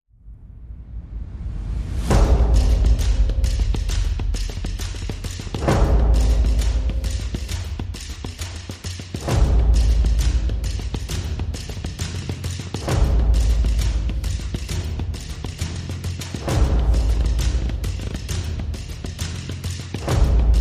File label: Perc Only